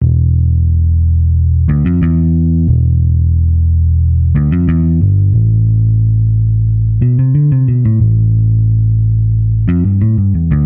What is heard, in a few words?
Bpm90 A Saturn Prog Bass